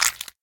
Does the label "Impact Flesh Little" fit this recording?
Yes